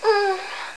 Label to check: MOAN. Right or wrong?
Right